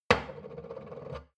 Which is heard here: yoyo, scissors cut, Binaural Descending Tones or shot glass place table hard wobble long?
shot glass place table hard wobble long